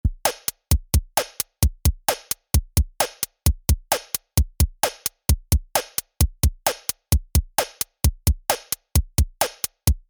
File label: Beat Start; Five12